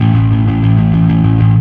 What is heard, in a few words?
Fuzz A3; Torchlight III; PS4